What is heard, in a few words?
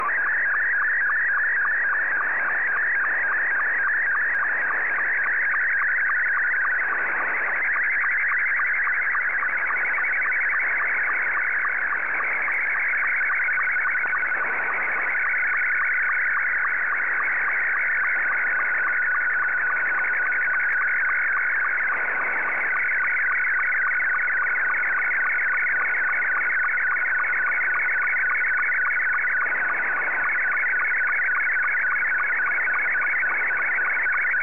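SSTV, Scottie 2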